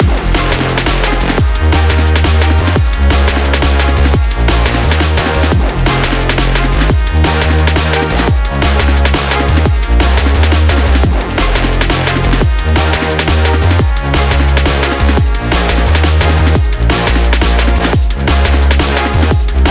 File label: Full Drop, drum and bass